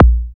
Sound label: BD, BD Dance; Sound & Vision; Gigapack I CD 1